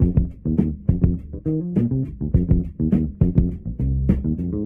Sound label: bass, Loops Phonky